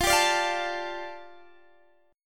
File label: G 7th Suspended 2nd